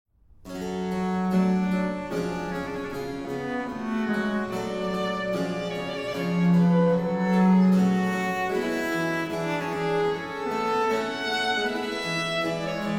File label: Adagio Vivace from Trio Sonata V in G minor